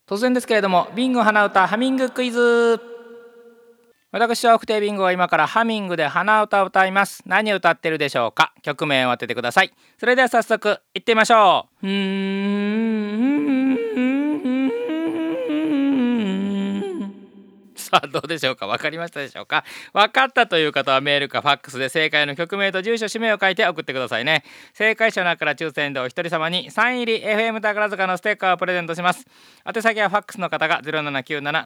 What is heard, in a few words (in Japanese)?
hanauta